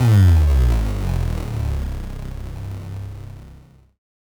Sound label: Bass Drop; SoundsCrate